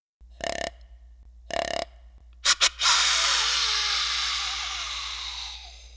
Deer Vocal; Animals; XBOX; BassProShopsTrophyHunter2007

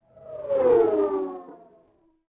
distant by; Star Wars Obi-Wan; XBOX

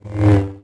lightsaber swing fast; weapons

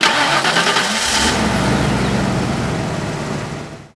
van ignition; cars; Max Payne

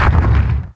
Place build

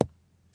golf ball hit rough; ProStroke Golf World Tour 2007

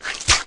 Mutant Spit Attack; Valleakan Mutant 1; Aliens

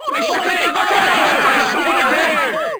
burst, Crowd

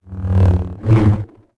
lightsaber attack; weapons

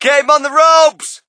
crowd, shout; Mike Tyson Heavyweight Boxing

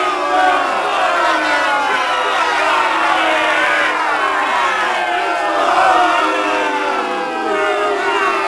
Small Crowd Boo; David Beckham Soccer; XBOX

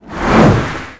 tunnel enter

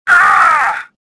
pain

